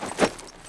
corpse; firefight

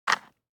DICE on Cardboard, Grab, Standard, 4 Four Dice; Samples; ArticulatedSounds; DICE Sfx Party (Extended)

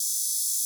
Menu Zoom Loop; Menu FX

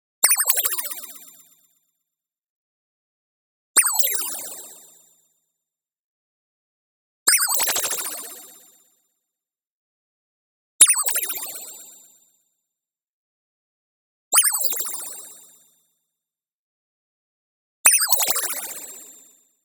Complex Ping; ZAP; boom library; Anime Essentials; DSGNMisc

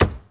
Porsche 911T Trunk Close; Automobiles

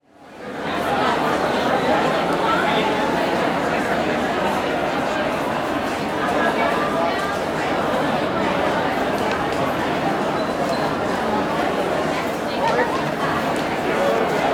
Crowd Indoor Reception Hall Lobby, Walla; Sound Ideas; Walla Series

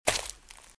Footsteps - Leaves - Walk - Right; RadwinIsland

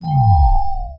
Scifi Power Up Lights; Energy & Forcefields

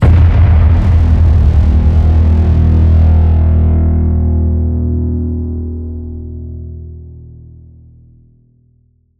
HIT SciFi, Cinematic Hits Designed

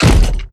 door kick; DeadFright

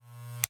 Electronic, Latch, click, heavy, clippers, off; Eiravaein Works; Vaeyan IV

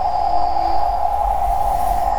Sci-fi UFO Loop; SFX Kit; Sci-Fi Loops

